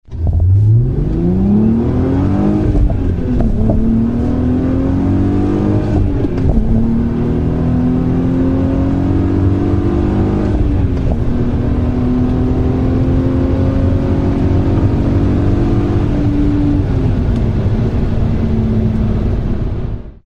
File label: New Beetle RSi 3,2 V6 - acceleration; volkswagen